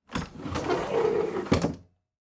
Household Cabinet Door Slide Close Wood Dumb Waiter